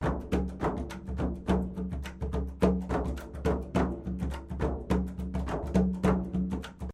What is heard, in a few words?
Indian Tutti; Outdoors Old